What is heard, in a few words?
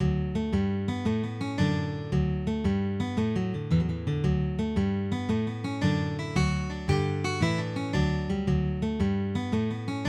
2 part jig; Off She Goes